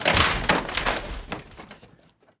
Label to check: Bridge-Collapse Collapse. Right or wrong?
Right